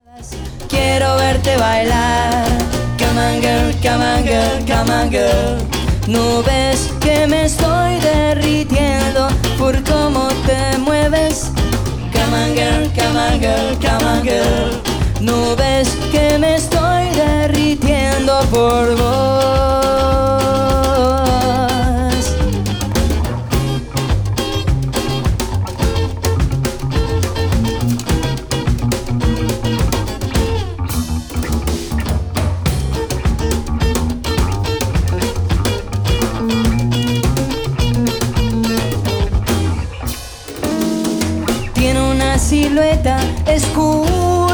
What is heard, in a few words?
Live Acoustic; Electroshock